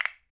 PER Percussion

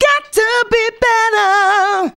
FEM HO, Female Hooks; Sound & Vision - Gigapack II CD 1

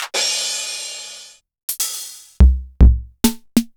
Korg Electribe ER-1 Kit; Sliced

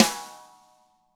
DW RIM FD, Double Platinum Drums; ILIO

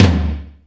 heavy t, Yamaha RX-5

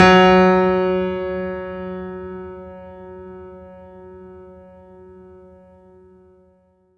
F#3, Synth Line; 90 s Sample CDs; NiceBeats; SynthLine VOL-22

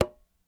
Sogo mute, World Percussion One Shots; Sony CS

